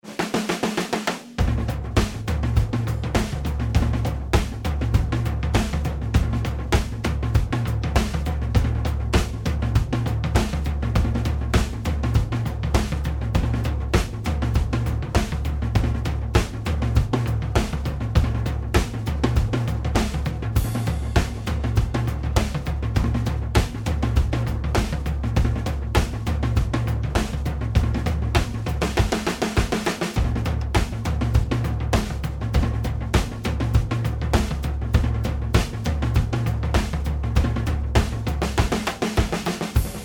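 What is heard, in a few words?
new wave, full mix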